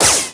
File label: ROCK